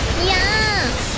ya~

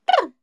brrr; noises

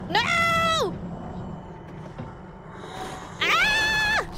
Scream Nooo AAAAH; IRyScream

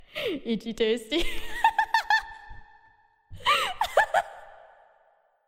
wholesome laugh itchy tasty

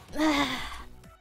breath; IRyS

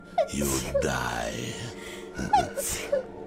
Sneeze

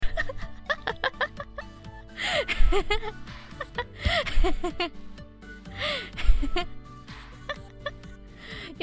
laugh

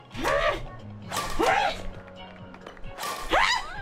badminton noises

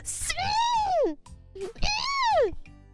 malding noises

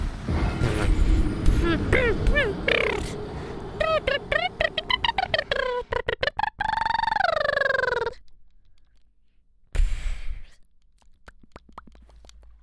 death noises

